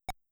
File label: Display Text; mother1